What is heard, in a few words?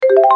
levelcomplete